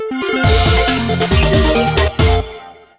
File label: Home Menu Jingle; Poke Transporter; pokemon bank poke transporter gamerip